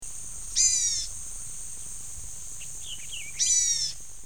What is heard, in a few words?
bg gnat Call, bird sounds